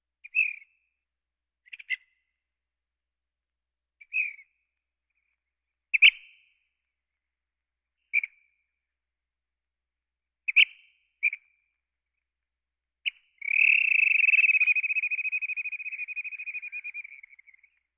Birds

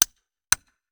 Musket Foley; Sound Ideas; Guns

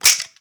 Snap Mousetrap; SFX Kit; Foley - Misc

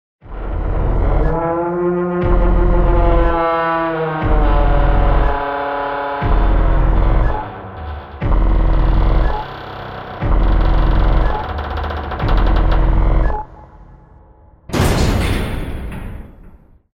Door First Time

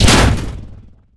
Pickup Scifi Energy; SFX Kit

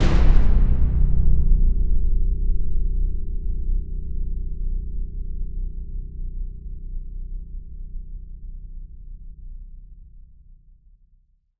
Evil Showdown, Cinematic Metal Impacts

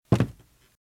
Footsteps - Wood - Creaky - Walk - Right

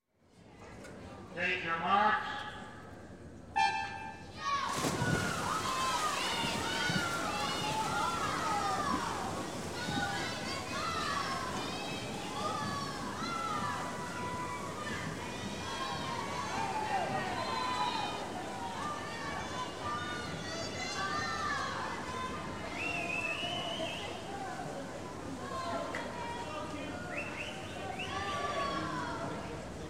Swimming Pool; Sound Ideas 2000 Series

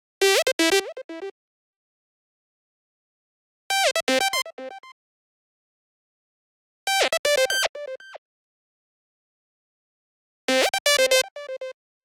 Arcade Jingle Positive

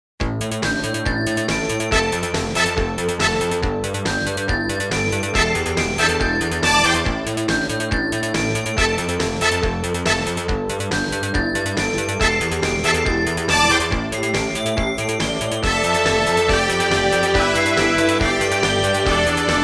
Jingles; 90 DDD Jingles

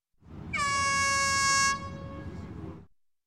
Air Horn; Sound Ideas 2000 Series

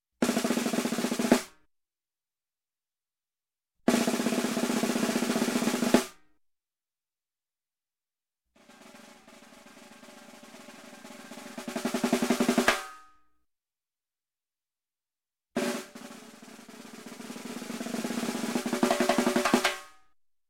Snare Drum snare Off, roll, Music, Percussion; Sound Ideas; Series 2000

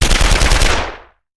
Machine Gun; Sound Ideas; Guns

